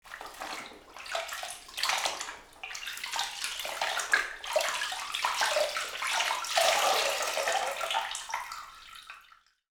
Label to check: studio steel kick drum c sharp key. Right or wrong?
Wrong